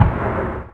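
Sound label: mg42 distant; WAR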